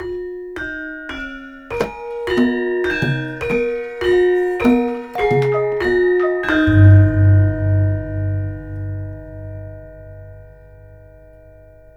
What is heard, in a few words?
GAMELAN HIT; Spectrasonics - Heart of Asia CD2